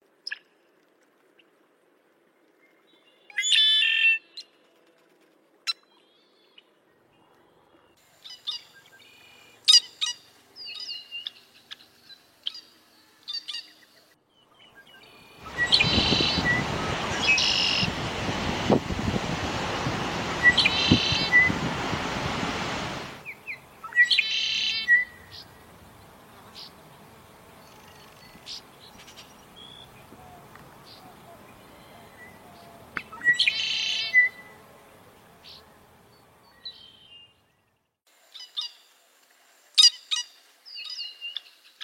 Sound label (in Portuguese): canto do grauna de asa vermelha